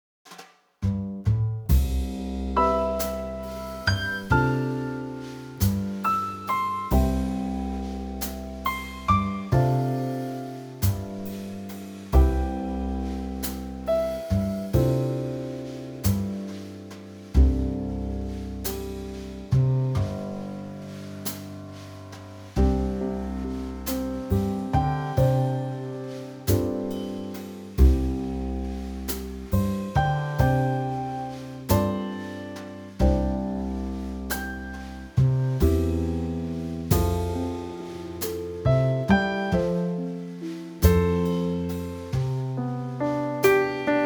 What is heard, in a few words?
C Trio